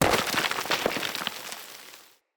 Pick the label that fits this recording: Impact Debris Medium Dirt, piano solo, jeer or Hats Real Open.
Impact Debris Medium Dirt